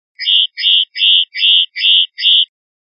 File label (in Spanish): rep golpes; linked files test pardillo